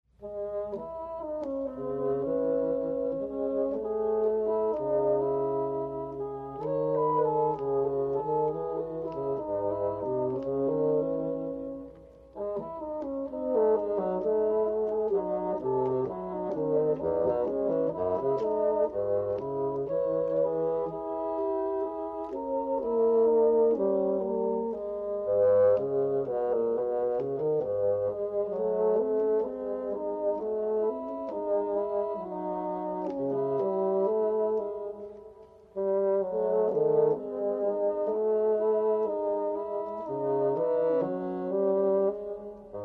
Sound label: duet